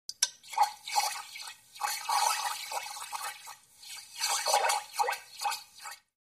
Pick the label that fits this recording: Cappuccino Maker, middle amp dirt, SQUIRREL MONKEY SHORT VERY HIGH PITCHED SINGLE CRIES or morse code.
Cappuccino Maker